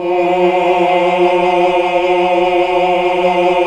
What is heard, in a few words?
AAH UNI